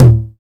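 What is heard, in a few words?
TR-909 Tom Low, Roland BK-5 Toms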